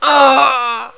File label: Ahhh; Riviera; Ein